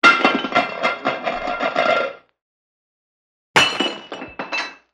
household dishes breaking; sound effects one

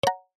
multimedia ui tone short plucked nylon

